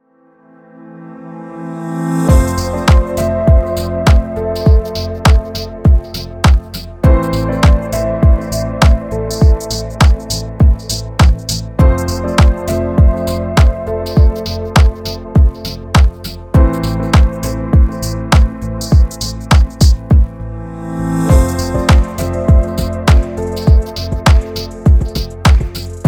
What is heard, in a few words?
ambient